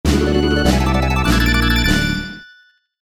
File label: Item Fanfare; Secret of Evermore